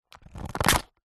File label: plastic disposable cup stab with knife